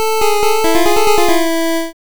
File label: jingle arabian